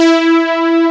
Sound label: S-LEAD